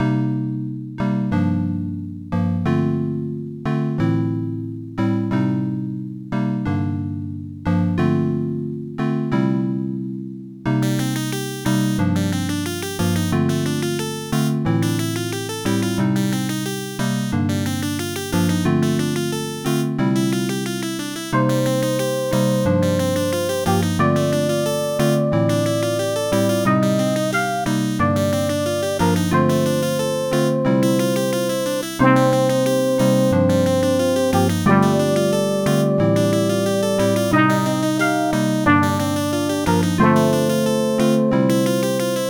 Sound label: OPL3; Tetris